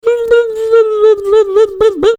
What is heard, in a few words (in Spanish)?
ritmos